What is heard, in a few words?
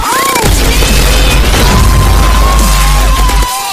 oh baby ah oohhhh